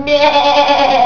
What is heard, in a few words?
sheep